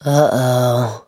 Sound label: lose; mikey graffiti